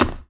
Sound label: glass, player steps; quake2